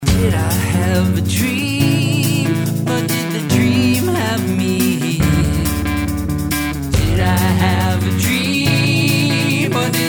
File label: nocturne bass chords